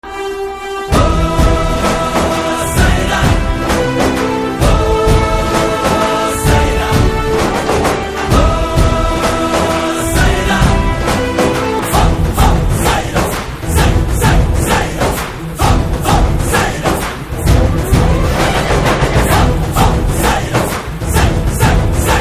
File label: BGM Telugu Ringtone; Sye Raa